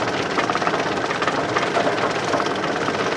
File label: Torture machine